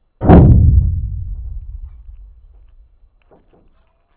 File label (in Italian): Sonificazione del 4.1 Lago di Bolsena